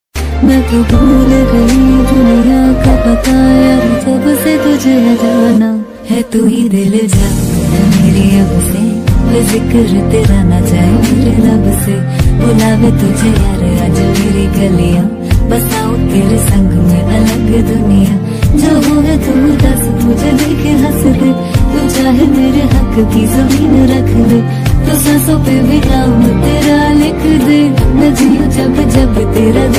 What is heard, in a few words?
Female Version hindi ringtone